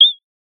Sound of a bird tweeting nicely

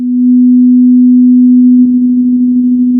pop, Sound of a cruise ship horn

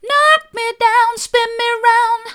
knock me down spin me round soft, vocal

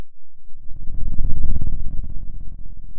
Sound of a dinosaur

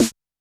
Elec Snr, drum

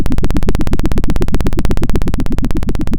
pop, Sound of a helicopter

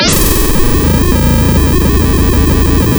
pop

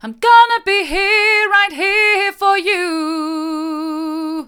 hard, vocal

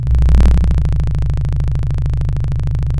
Sound of a chainsaw

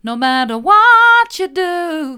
mid, vocal

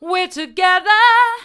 we're together hard, vocal

